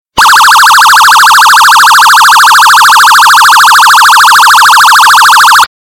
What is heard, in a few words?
Annoying Siren Ringtone